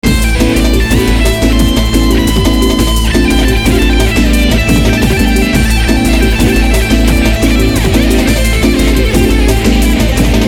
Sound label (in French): solo, guitare